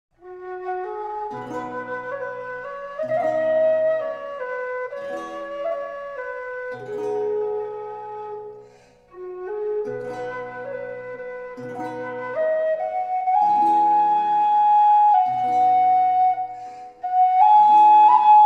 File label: whistle low f; atma